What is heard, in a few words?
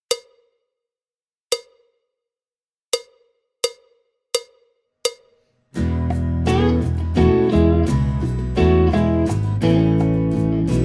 backing track without click